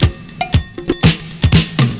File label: PERCS, 70 s Breakbeats